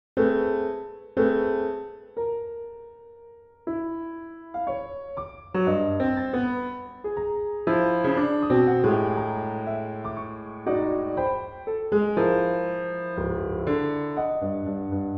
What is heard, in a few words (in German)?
Klavierstucke